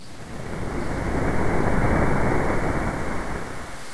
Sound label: ambient